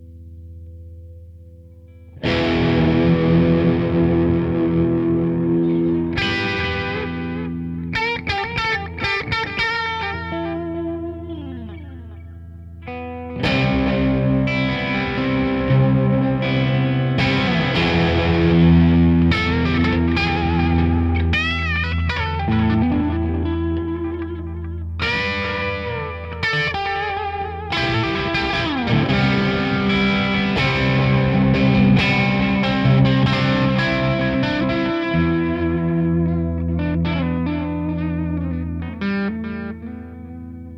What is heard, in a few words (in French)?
rock gros crunch solo, guitare